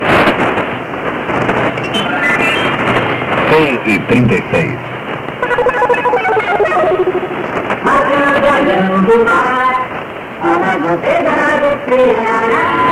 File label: jingle; cassette